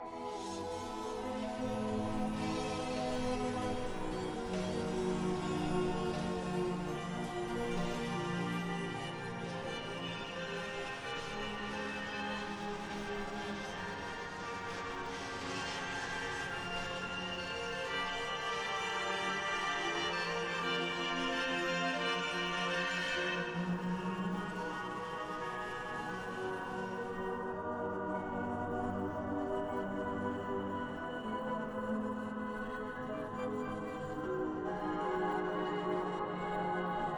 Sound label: strings (strings, piano and explore noise); stems